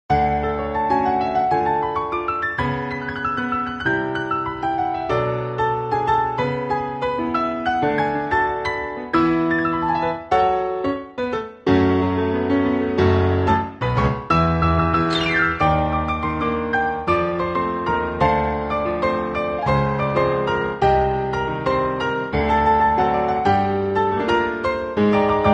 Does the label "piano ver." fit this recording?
Yes